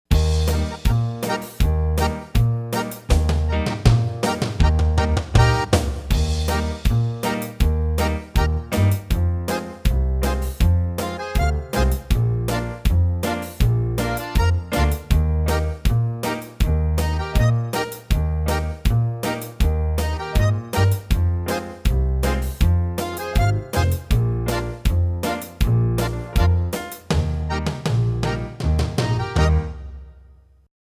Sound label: oom pah band 80